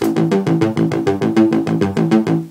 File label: bass line